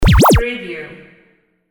button

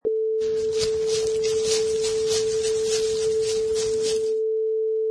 Running through Leaves

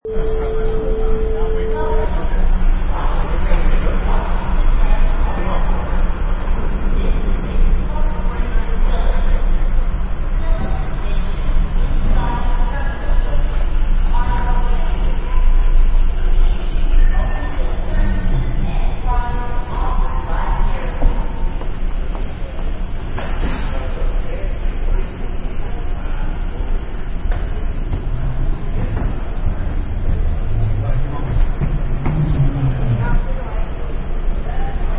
Airport Ambience

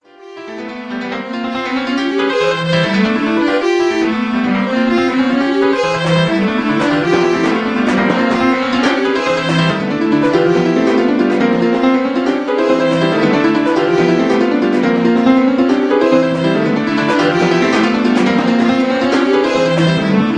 Orchastral Mess

